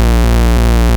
Root A#, Ultimate Bass; Vengeance Samples

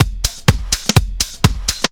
drum loop 125, vocal house; PS-28 Vocal House Samples; drumloops; houseworx

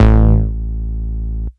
Bass Root A

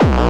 BD Distortion, Bassdrums; Vengeance Samples

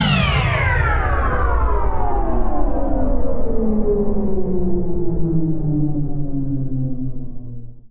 Downlifter

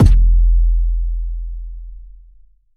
Soft Kick; Vengeance Samples

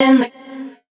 Vocal Oneshots; Vengeance Samples; Vocals

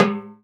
Bongo; Vengeance Samples; VEH1 Percussion

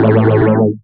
Synth Shot; Vengeance Samples

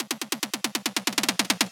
Loops Fills; Vengeance Samples

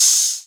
Open Hihats, Cymbals; Vengeance Samples

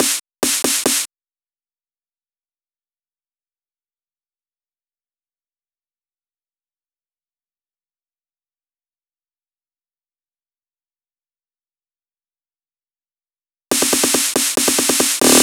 Snares, 140 BPM; Vengeance Samples; Vocal-Hook Kits; Song Kit

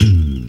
Slides; FX; Vengeance Samples

